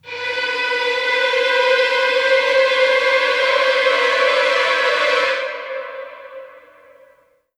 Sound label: Horror Suspense Build